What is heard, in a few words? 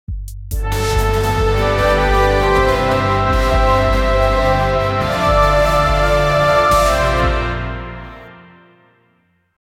tense music underscore